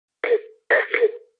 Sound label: back n forth; Ze Franks Pain Pack